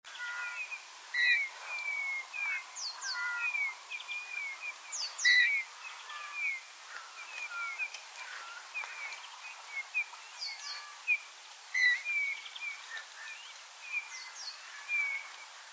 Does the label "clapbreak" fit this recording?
No